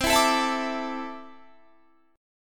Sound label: C 6th Add 9th C down Guitar Standard; C6add9 strummed; capo=3